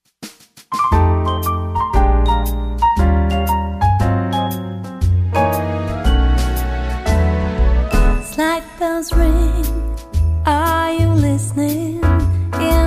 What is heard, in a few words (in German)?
musikduo light lounge; Musikagentur berlinklang; Live-Musik